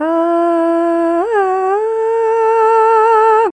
fem short vox, female singing; VOCALS and SPOKEN WORD

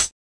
hat; Hats

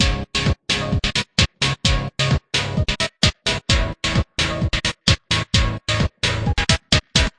130 thin gated trance chords; SYNTH AND ELECTRONIC LOOPS

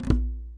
arabic perc, Arabic Percussion Kit

